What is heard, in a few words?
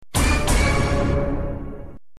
Chan chan sound effect